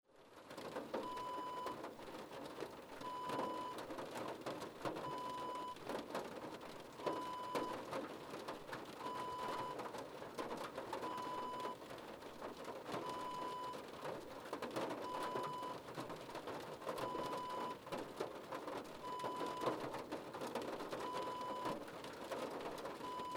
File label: rain on car roof